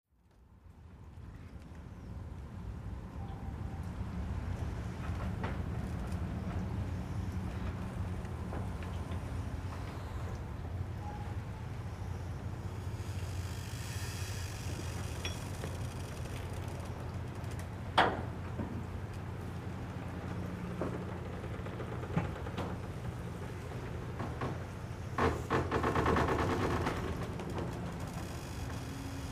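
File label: Dock Ambience night Light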